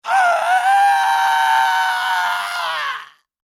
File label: Horror Scream Male; Screaming; Voice